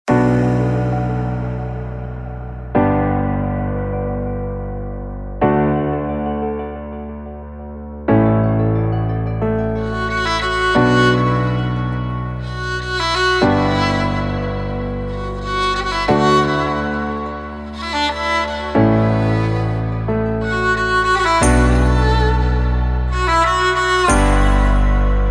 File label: Violinist